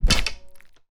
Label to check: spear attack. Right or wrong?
Right